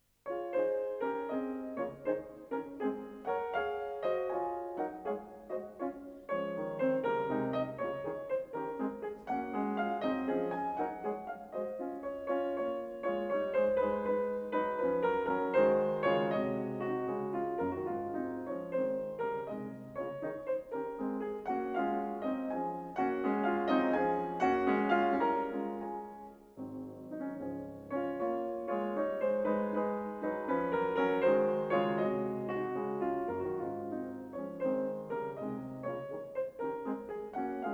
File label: sonata for piano; L. Betkhoven; No. 14; II. Allegretto; 6-7 klass dmsh